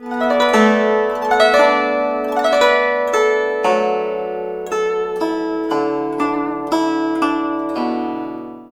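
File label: guzheng; Spectrasonics; Heart of Asia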